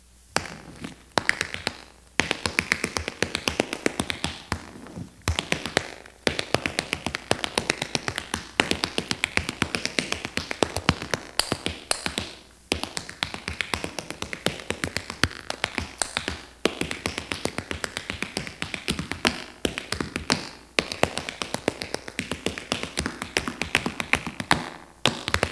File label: solo TAP